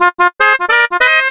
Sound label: horn; TrackMania United; Skins